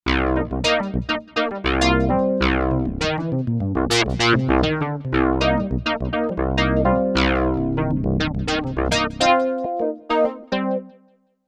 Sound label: Futa Funk, synth